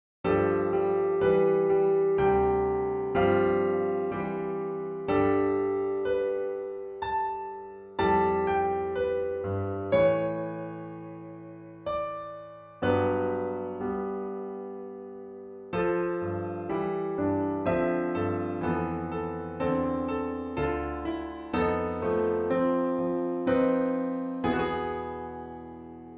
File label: Piano Moods, Diversiform Duet